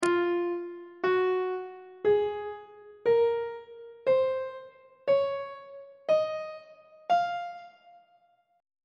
e sharp phrygian mode note positions on treble clef